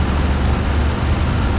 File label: cord; Mafie 1